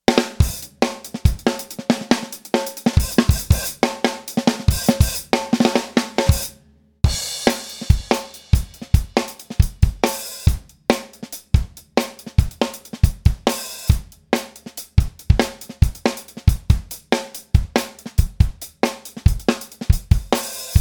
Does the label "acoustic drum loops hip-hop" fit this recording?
Yes